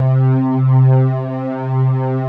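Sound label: synth strings, JV 1080; Pro-Rec - Synthsonic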